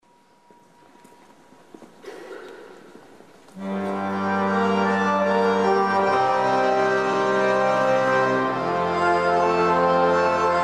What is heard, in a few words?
Praeludium g-moll